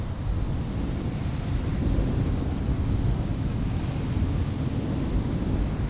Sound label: sandstorm; environment; Tribes2